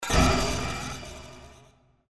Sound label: impact